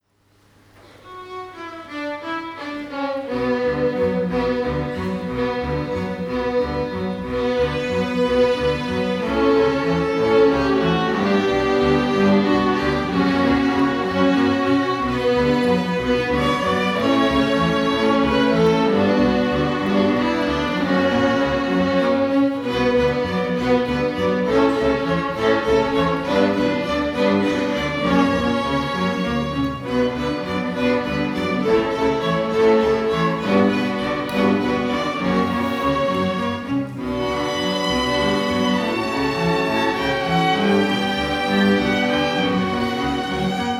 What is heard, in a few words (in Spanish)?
Orquesta de Camara, Spanish Violin; XI Bienal de Polifonia Ciudad de Cangas de Onis; de la Escolania de Covadonga